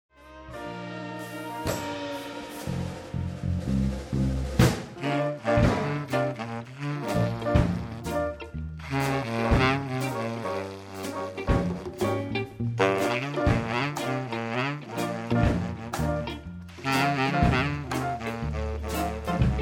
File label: Reggae; Bari Thema